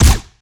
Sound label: Lasrgun Guardian Pistol Fire